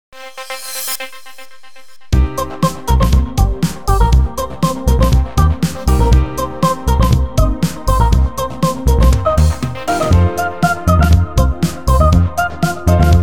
Electronic, EDM

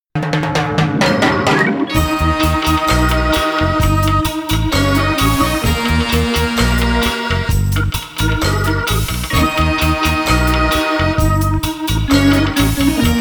Reggae; 예능BG

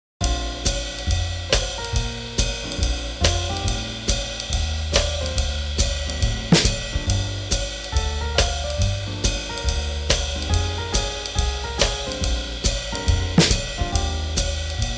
Swing Jazz

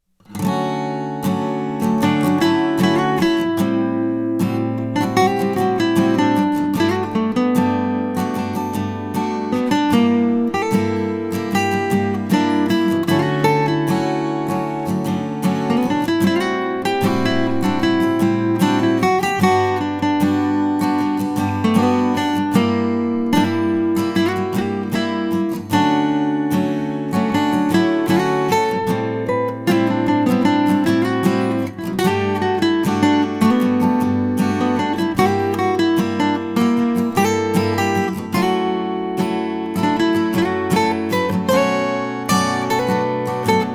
Bike

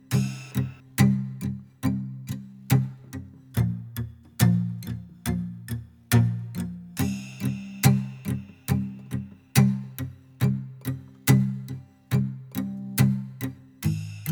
African strum

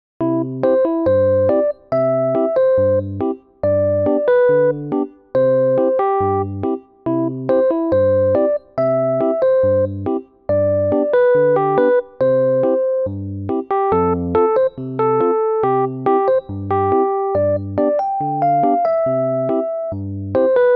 Newage; 밝고 맑은

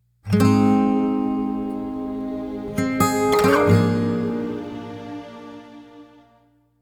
Broke Front Short

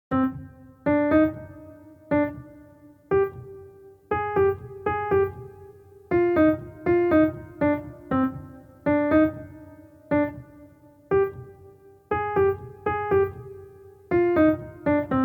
Forest; Comic 드라마

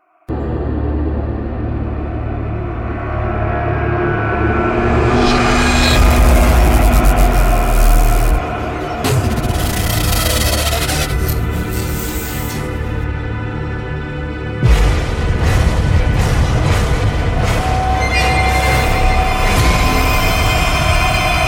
Serious Happen, Tension 공포

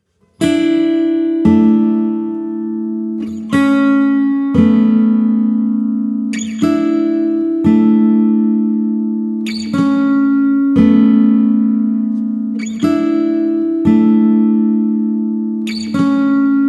Tension Guitar A

